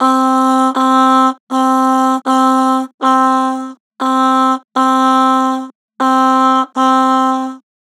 "Aah", Harmor Vocals

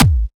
Kick, dubstep

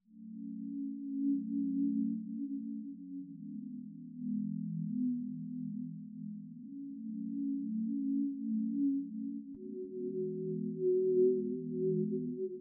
152 BPM D# Min Pad 1; Loop Stems; Cymatics; Make You Mine

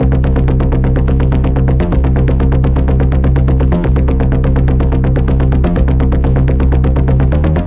Bass Cm 125Bpm, Techno; Bass Loops; Stalker; Ghosthack Advent Calendar - Day 6 - Techno Kit

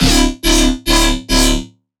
Riddim Bass Hit D; One Shots; Artificial; Ghosthack; Riddim Essentials 2020

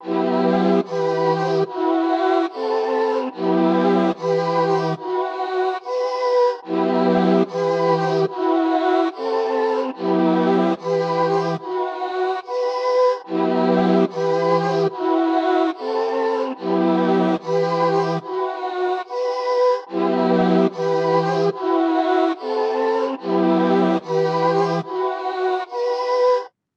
145 BPM E Min Main Melody, Drill; Loop Stems; Cymatics; 2022 Melody Collection; Flows

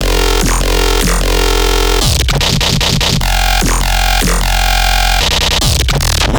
Bass Shot F 150 Drill, Dubstep Basses; Loops; GhostHack; Advent Calendar 2021

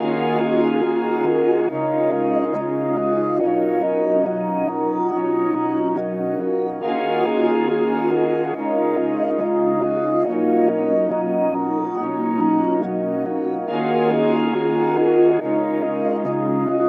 140 BPM D# Min, Reverse; Cymatics; 2022 Melody Collection

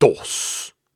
Grunts and Chants, Viking Vocal

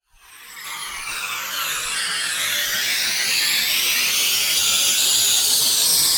Murkiness Riser FX, Dubstep; One-Shots; Effects; Ghosthack; Dubstep Sounds 2025

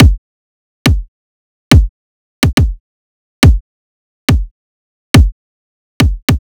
Riddim Drum Loop 140 Kick

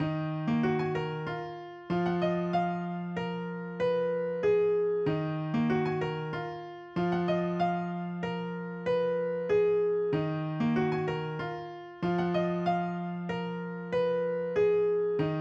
Piano, 95 BPM D# Min; Dope

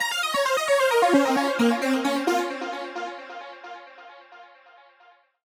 PHRASE - 132 BPM A# Min, Ear Candy; Arch; Cymatics; ANALOG EVOLUTION Beta

